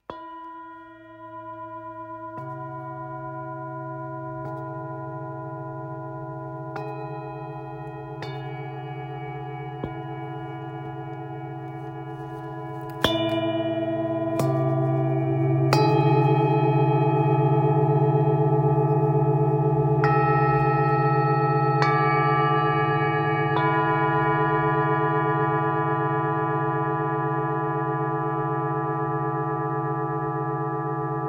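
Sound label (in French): Set 3 bols therapeutes, bols chantants tibétains; Etoile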